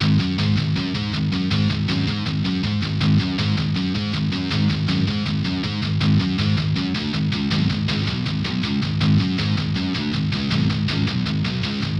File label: cinematic driving heavy Metal B 80bpm; Cinematic Trailer Loops